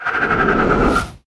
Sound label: princess teleport IN